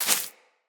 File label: Bullet Impact Debris Grass; Weapons